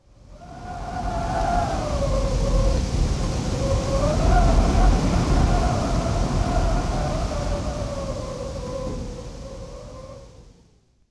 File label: wind; DAoC